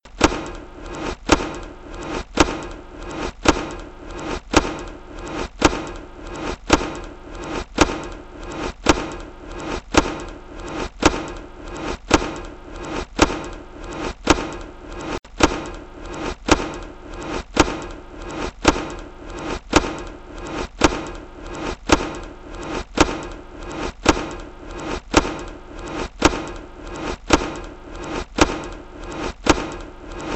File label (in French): Pied contre poche plastique